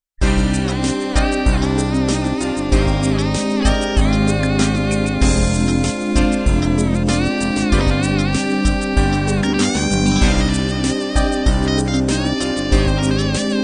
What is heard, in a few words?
16 beat analog end